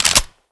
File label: clip in, weapons